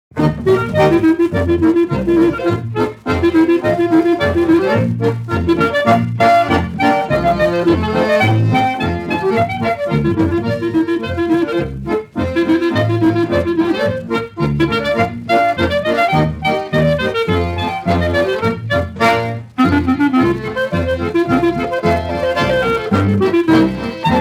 Swiss Polka; Sauk Prairie

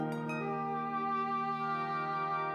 Music Only; Electa Quinney

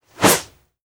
Whoosh, Quick, Short, Airy, Thick; Universal SFX

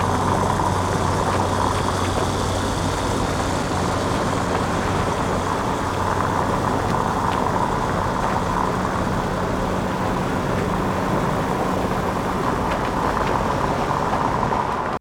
Car Road Noise; Poetry Project

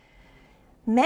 Birds

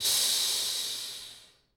Air, Release, Steam, Rain Stick; Electa Quinney

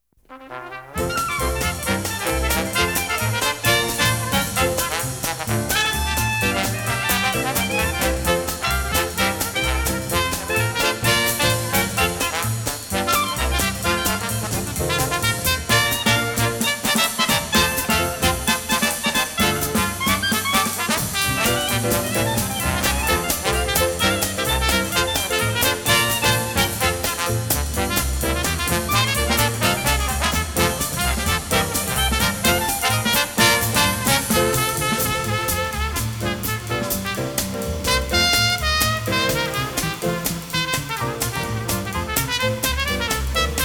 stomp

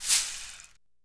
Abacus Rattle; Electa Quinney

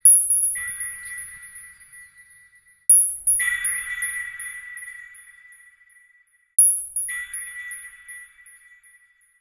Bell, x3, Sharp, Tinkle, Jingle, Bouncy, Sci-Fi; Universal SFX

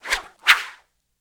Whooshes, Arrow, Feather, Airy; Universal SFX

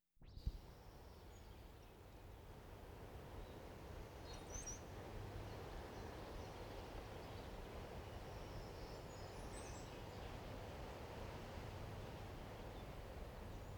Pines and Birds; Electa Quinney

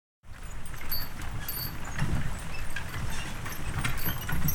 Horse Drawn Wagon; Electa Quinney

